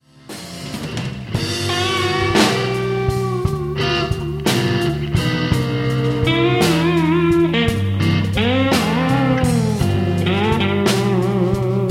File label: Slow Blues, rock